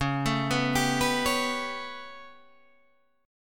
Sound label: B 7th Suspended 2nd Sharp 5th C sharp